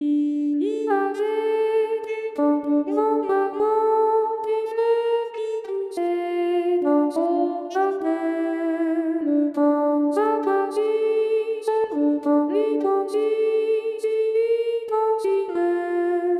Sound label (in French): Alti, chorale